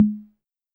Tom Hi, Roland TR-55

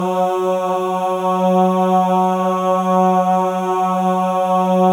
MALE AHS, Symphony of Voices; Spectrasonics

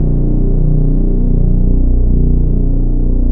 C 1, PROPHET 10; Sound Gallery - Charged

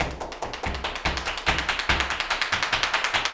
flamenco tapping, jaleo

